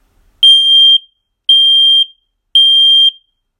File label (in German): Alarmton; GS505; Cordes